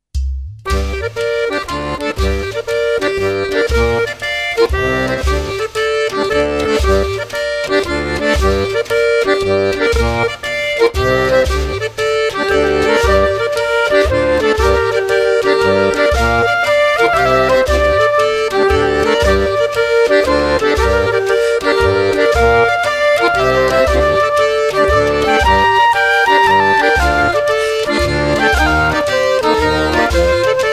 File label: polska